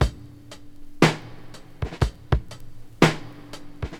60 bpm breakbeat sample D# key, Free drum beat - kick tuned to the D# note. Loudest frequency: 996Hz